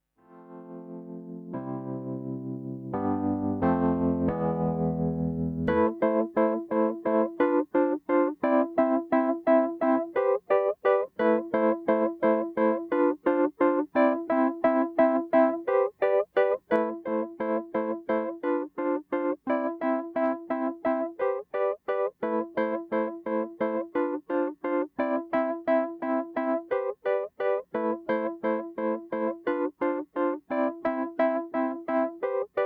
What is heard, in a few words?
Keys